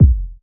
clean steel kick drum sound c key, kick single hit tuned to the C note